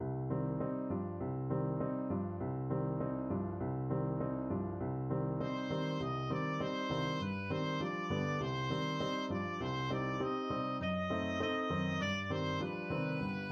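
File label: Rach Piano 3, oboe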